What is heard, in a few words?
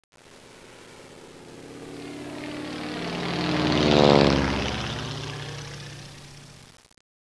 proplane; freeciv